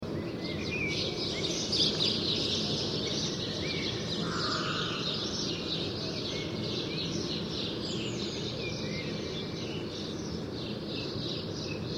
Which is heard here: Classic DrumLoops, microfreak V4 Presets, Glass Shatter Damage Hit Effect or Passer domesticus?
Passer domesticus